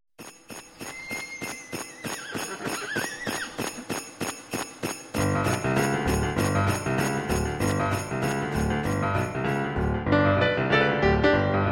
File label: Jingle Bells Boogie